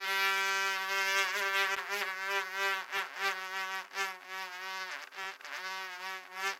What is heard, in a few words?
fly